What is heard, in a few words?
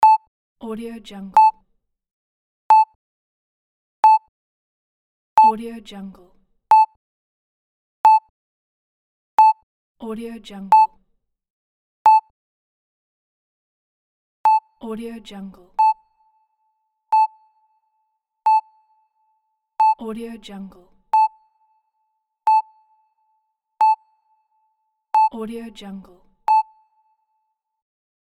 heart monitor beep